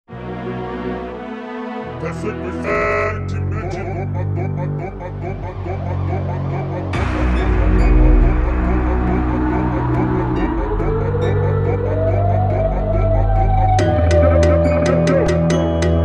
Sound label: FUNK; SIREN HEAD